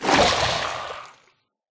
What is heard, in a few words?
convert, drowned; mob; Minecraft